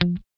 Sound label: GUI F3, E-GUI MUTED; Sound & Vision; Gigapack I CD 2